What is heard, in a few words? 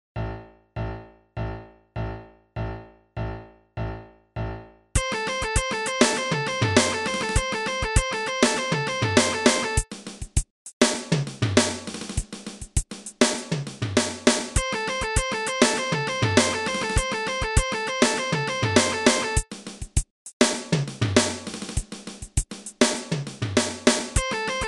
Guitar riff, 100 bpm; speedpick